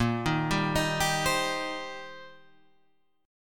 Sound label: C Minor Bb, Cm/Bb chord; arpeggiated; JGuitar